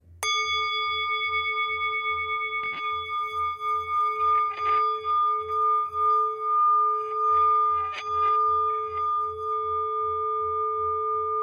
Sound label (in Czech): tibetska misa